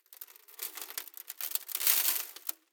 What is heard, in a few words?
sand auto; ambient; dust2